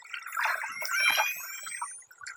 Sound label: Chirp zap chirp